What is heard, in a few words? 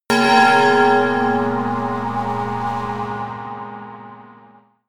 melodic church bell pad high